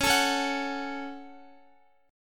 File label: C sharp Suspended 4th Sharp 5th, Guitar; C#sus4#5; strummed; x,x,11,14,13,13; 4E,4A,5D,5G,5C,6F